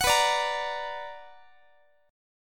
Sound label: B Minor Major 7th, Guitar; strummed; JGuitar